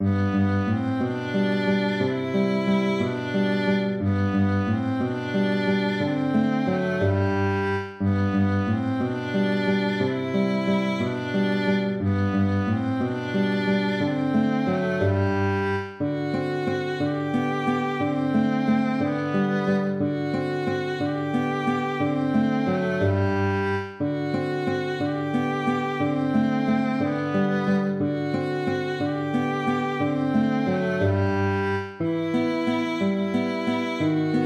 waltz; Ferdinando Carulli

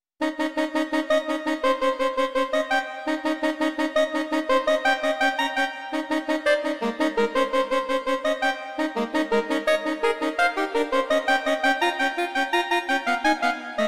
bagatelles agitato

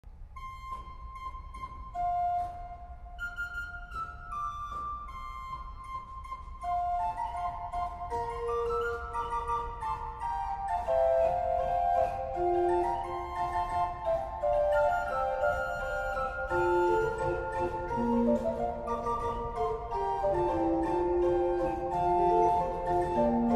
seconda canzone italiana organ transcription; Adriano Banchieri

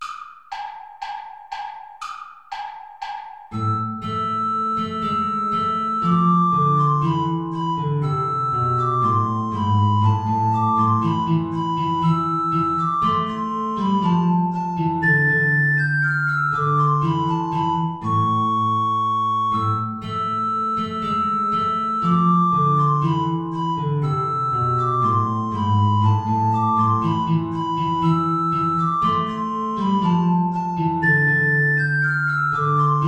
play along without soprano recorder

